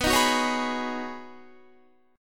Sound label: B 6th Add 9th B Guitar, chord; B6add9; strummed; 18,18,18,18,20,19